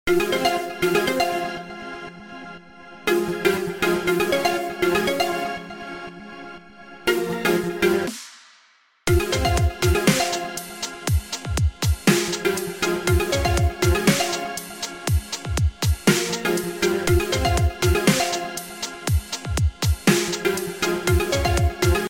Ringtone

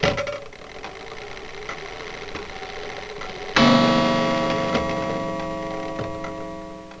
Clock

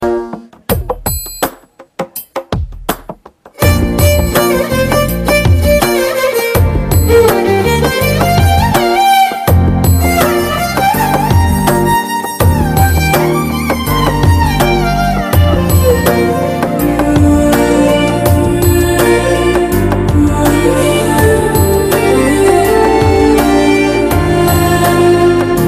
Temple Music; Eega

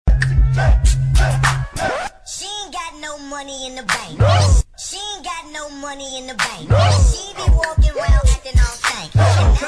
Bounce Short

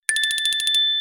Tiny Bell

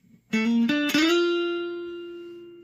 Short Guitar Riff

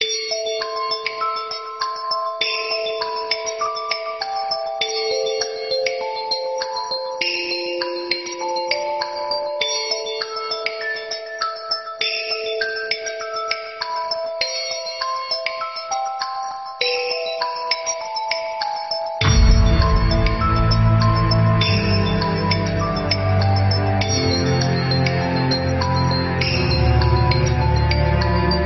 Classical

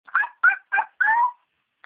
barking

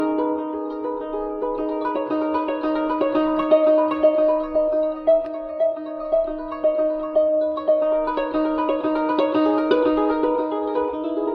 Koto classic

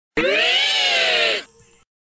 stand cry

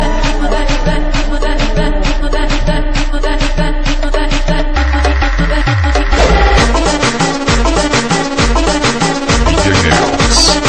Tribal House

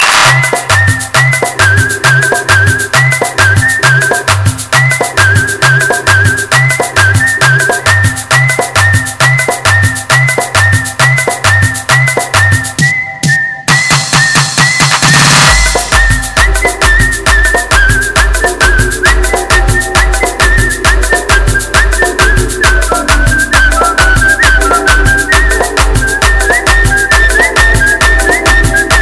Tribal

